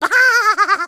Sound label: Pirate Rifleman; gamerip